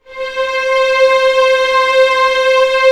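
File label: strings C4 FF L; Sound On Sound - Peter Siedleczek's Orchestra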